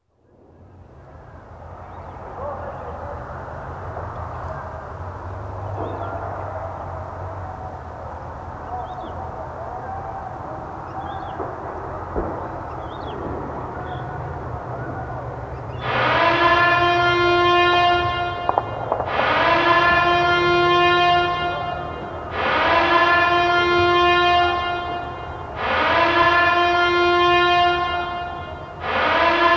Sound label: power alarm